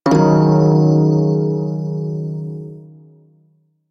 Prime Shawzin Yo Chord D; Music; Warframe

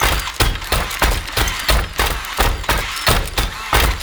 Melee Walk Loop; Enemies; Warframe; Necramech

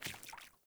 Sandals Walk Water, Footsteps; Characters; Player

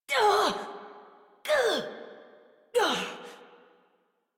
Struggle Long, Adult; Warframe; New War; Adult Operator

